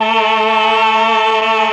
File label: CHOR, Propeller Island Legendary M400; ILIO